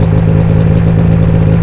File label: idle, sled; WhiteOut